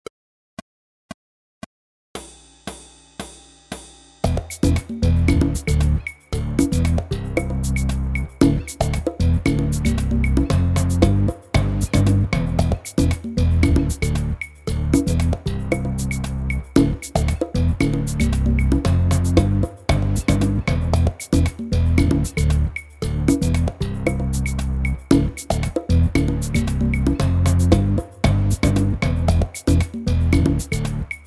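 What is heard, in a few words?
multi latin groove loop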